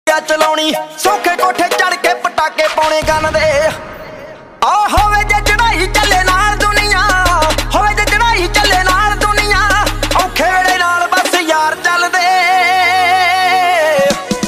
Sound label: DJ Punjabi Ringtone